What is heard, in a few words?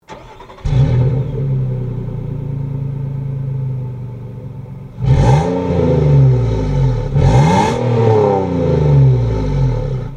Nissan Xterra